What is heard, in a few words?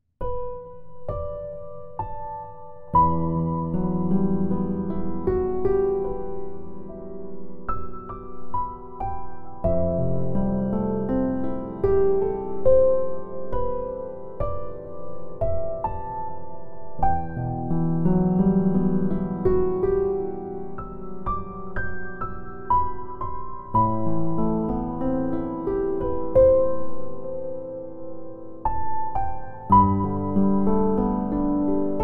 Upright U1 Felt